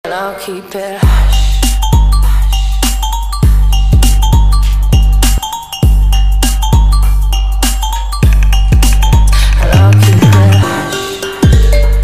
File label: Trap Remix